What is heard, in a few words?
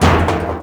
metal drum hit; bouncyobjects; Tony Hawk's Project 8; XBOX